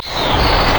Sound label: ramp; LoL; xerath base